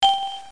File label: NOISE CQ WINDOW; kst4contest